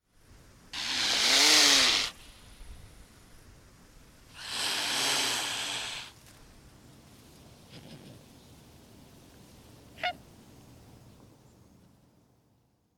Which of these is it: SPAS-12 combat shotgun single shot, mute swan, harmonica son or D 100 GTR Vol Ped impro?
mute swan